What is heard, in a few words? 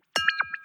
ting dual channel 44.1; mozilla-central; webaudio